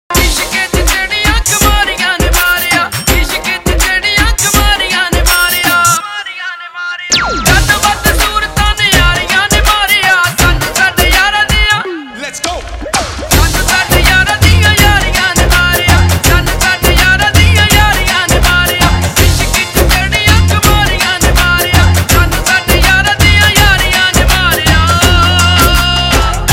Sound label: Punjabi Remix Tones; Punjabi Remix Ringtones